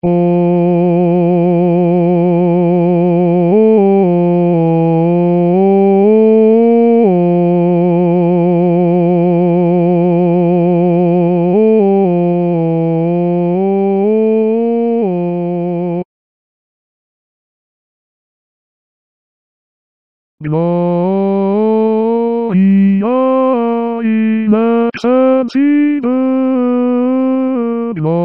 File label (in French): Basse; Les Anges Dans Nos Campagnes